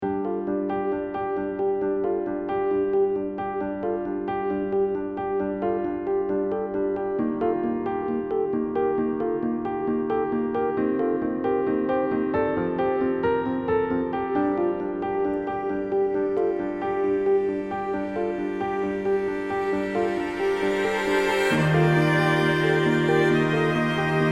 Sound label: bg music